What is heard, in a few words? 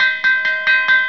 ALARM